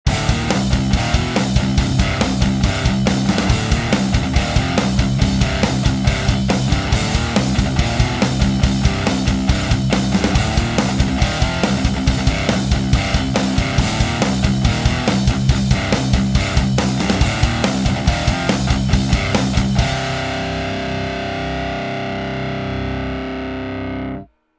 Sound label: METAL low gain; Axiom; Hans Metal Pack